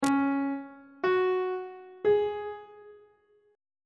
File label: d flat suspended 4th triad chord intervals; on treble clef